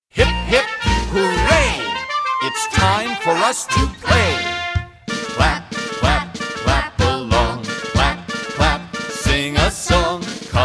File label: KIMBO